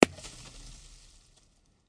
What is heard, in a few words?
snowball brick, Throwing Snowballs; Throwing Snowballs Kit